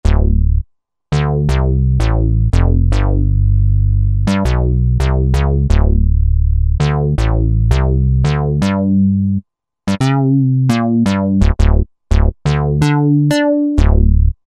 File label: Juno Bass 2, Roland SR-JV80-12 Hip Hop Collection